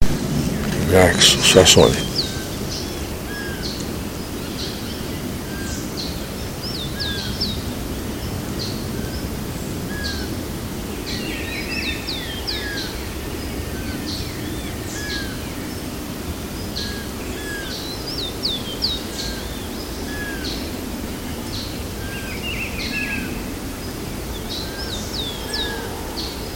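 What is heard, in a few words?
Myiarchus swainsoni, Jardim Botanico SP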